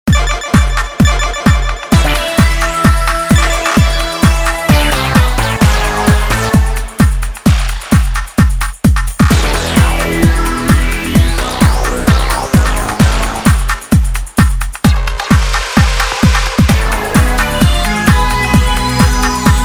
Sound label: Toccata and Fugue in D Minor Techno arcade remix; gyruss; full tilt